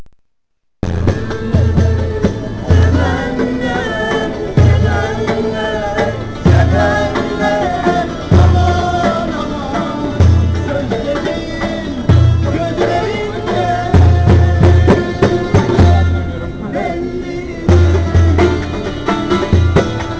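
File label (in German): Strassenmusik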